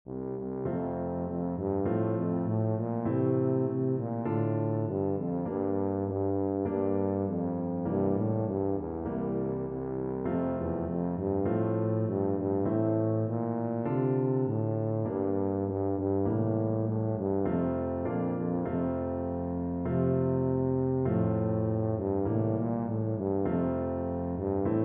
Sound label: simple gifts, tuba